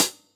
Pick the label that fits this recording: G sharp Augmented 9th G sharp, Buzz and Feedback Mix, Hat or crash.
Hat